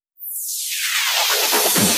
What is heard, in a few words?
Fill 128 BPM; Vengeance Samples; VEH1 Loops